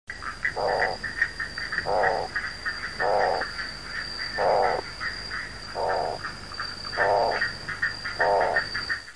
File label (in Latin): otus insularis